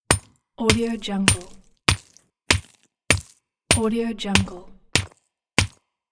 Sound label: pickaxe hits